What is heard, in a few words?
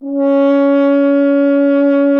F. Horn; Miroslav Vitous; Solo Instruments VOL-2